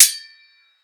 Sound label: sword